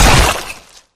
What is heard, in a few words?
pop; flood